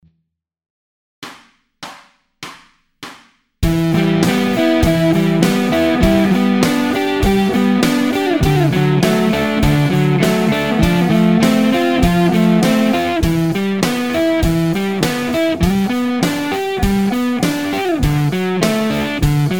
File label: Riff, guitar